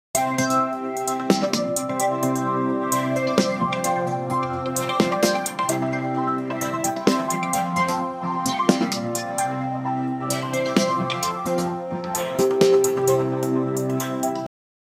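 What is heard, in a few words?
a playfull for kids channel, intro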